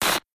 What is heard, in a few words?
TV channel static noise